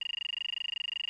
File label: scr scroll, computer; cardassian